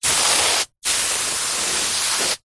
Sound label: household hose water spray heavy pressure onto grass